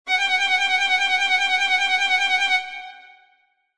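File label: sounds of violin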